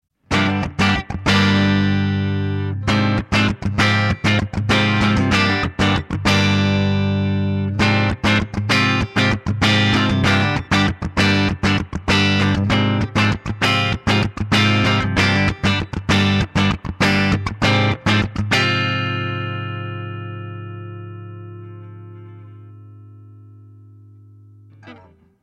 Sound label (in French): clair rythm